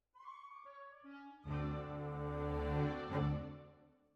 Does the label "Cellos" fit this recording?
Yes